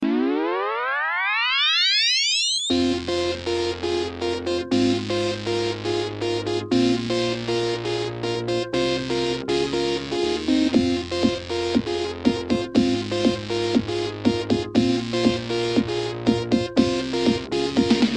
rave dance; Cool Spot

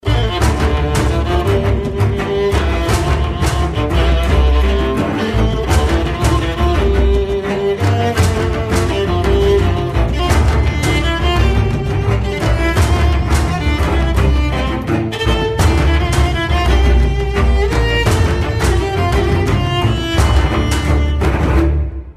Orchestral Theme; Battlefield: Bad Company

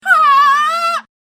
haaaaa

